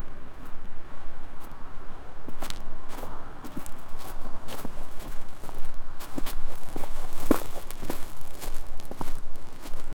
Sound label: walking in sand, footsteps